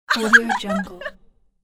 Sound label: girl burst of laugh